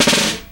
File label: snare pickup; Sonic Foundry (Sony Creative Software); Ugly Remnants VOL-2; Beatstuff